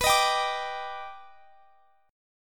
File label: D sharp Augmented Major 7th B, chord